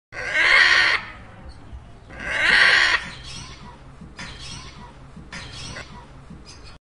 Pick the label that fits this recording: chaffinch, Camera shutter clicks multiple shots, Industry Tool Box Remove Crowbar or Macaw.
Macaw